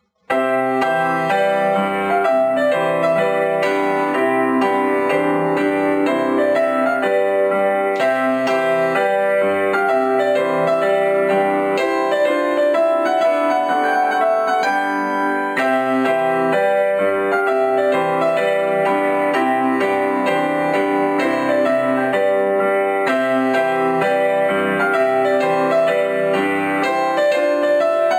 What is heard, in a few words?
Trumpet Tune